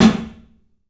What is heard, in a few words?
CLUB SNARE; 3D Sound; 3D Drums